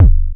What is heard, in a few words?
kickdrum